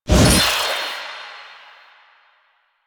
Stalker Jade Scythe Whoosh; Warframe

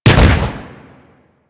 Fire Shot, Weapons; Warframe; Boar

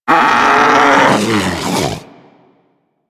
Predator Vocal Death; Warframe; Hunting Critters

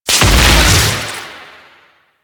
Beam Blast; Warframe; Captain Vor

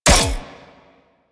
Full Draw Mech; Warframe; Infested; Derelict Cernos Prime

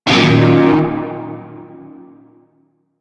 Shawzin Yo Chord C; Warframe; Narmer Shawzin

